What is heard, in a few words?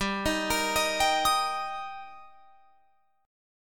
G Minor Sharp 5th G Guitar Open F, chord; arpeggiated; JGuitar